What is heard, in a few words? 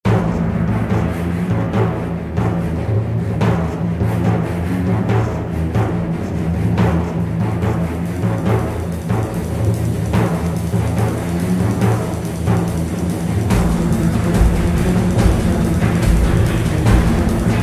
battle mix